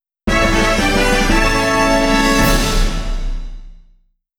Fighting Win; Patapon

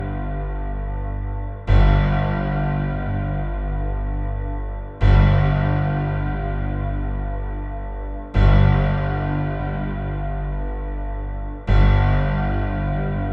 boss loop 144bpm; chicory a colorful tale; ps4

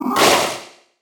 windup

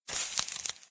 Ash Disappearing; doodle jump 2; android

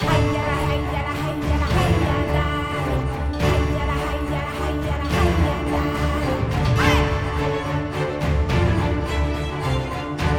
Battle Tense; Toussaint; Dun Tynne; The Witcher 3 Wild Hunt

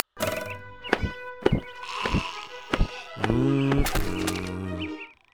Slingshot Underpower Music; barnyard; playstation 2

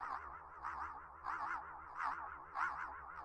Micro Spaceship Loop

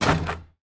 Score 3-Pointer; doodle jump 2; android

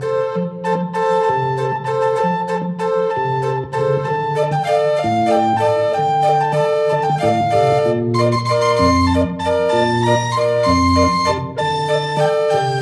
Carnival Organ Cover

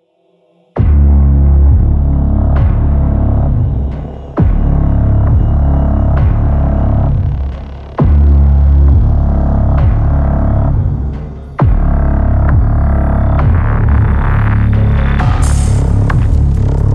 Main Ambient; Doom Hunter Base

